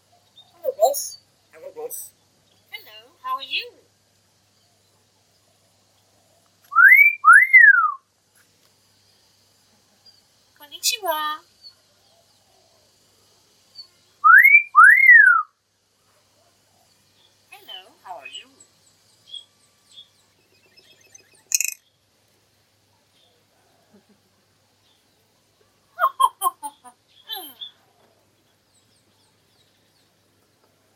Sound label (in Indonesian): Suara Burung Beo Gacor Alami dan Bicara